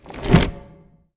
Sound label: stone summon; arivan